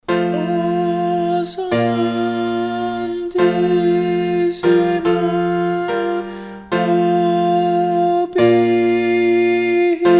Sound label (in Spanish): O santissima Contraltos, Musica SACRA